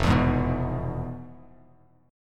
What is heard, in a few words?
F Minor Major 7th Flat 5th F Bass Standard, chord; FmM7b5 strummed; JGuitar